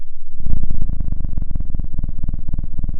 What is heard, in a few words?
pop, Sound of a cat purring